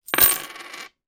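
foley 50 and 20 pence piece coins put down on surface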